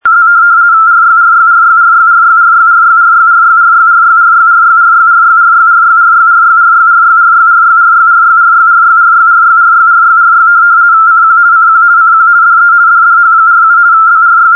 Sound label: hamburg meteo, RTTY; Hamburg Meteo (DWD, Deutscher Wetter Dienst); listen here to the RTTY test tape, recorded from longwave with only 85hz signal shift; europe